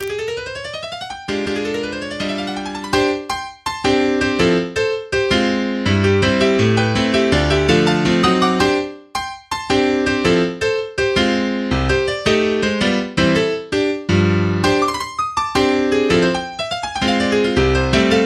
Jazz Prelude

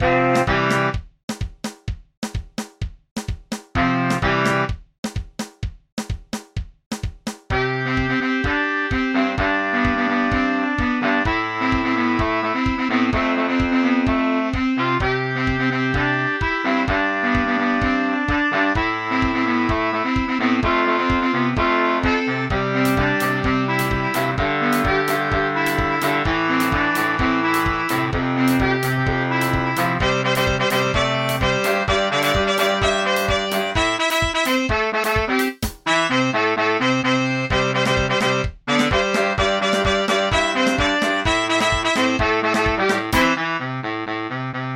sax

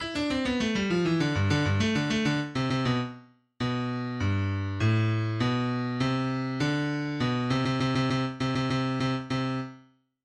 Bass Test

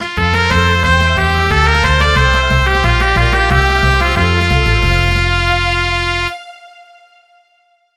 Boss Victory

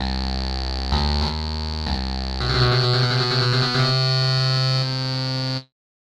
Game Start

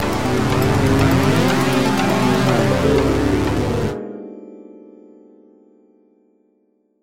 Warp Tile

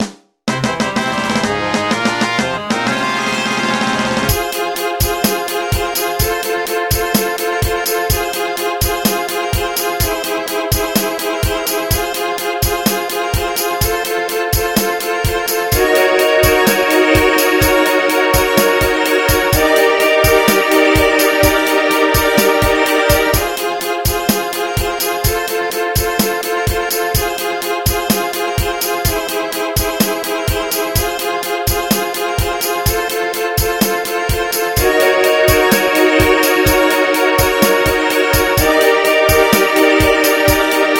Victory Fanfare

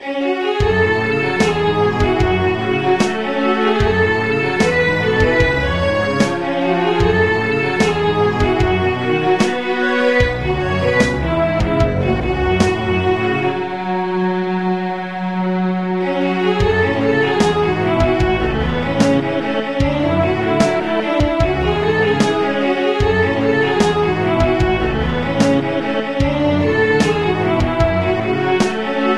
Strings Percussion

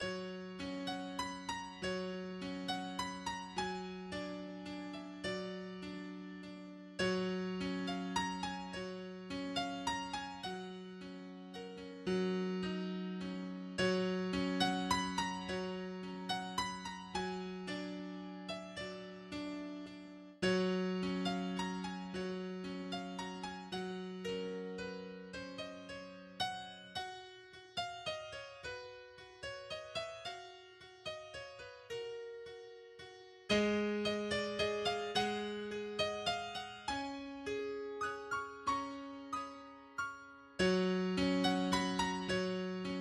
Lullabye Distant Melody

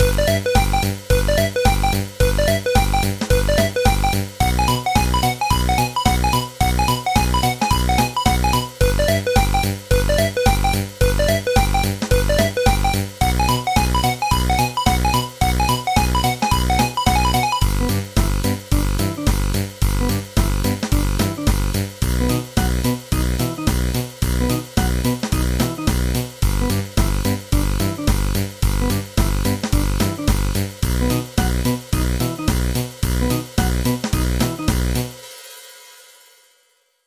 High Scores BGM

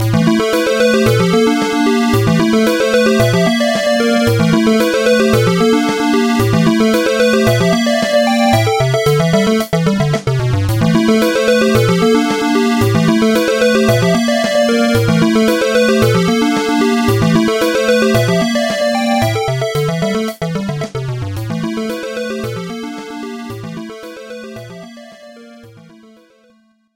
Game Over

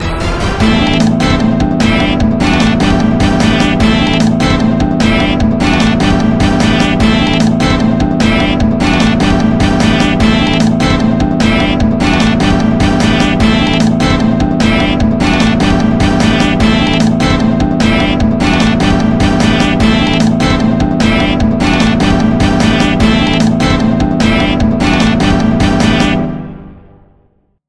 Bass Bumpin Rmx

